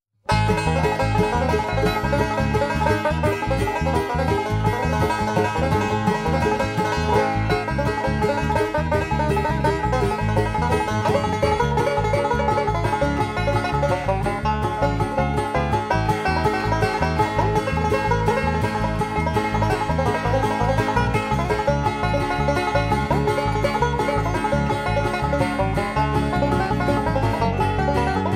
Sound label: banjo